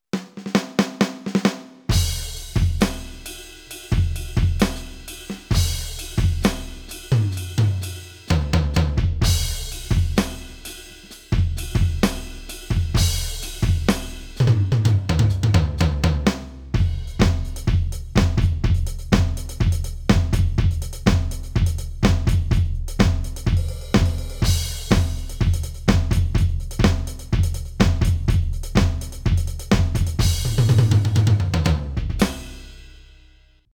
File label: Kit Grunge; SIMMONS SD200